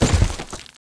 DROP Normal

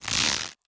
Weapons Arrow Ready

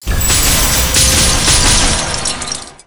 Area Attack; Motona

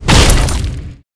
Dead Impact

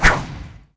WHIP

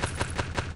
Swish

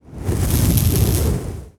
boost

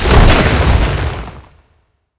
totem regen

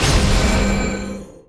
blast

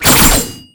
SWORD

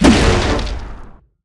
Weapons Swing

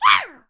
ball red

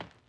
FOOT

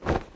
drop magic

